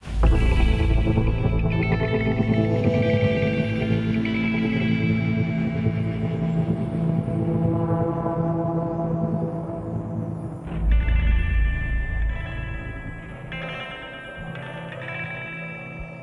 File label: Main menu music